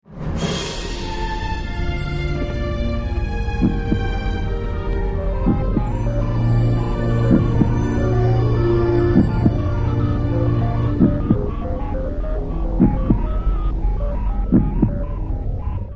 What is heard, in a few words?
Craft Chest